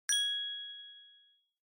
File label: Inspiration Mid; Onoma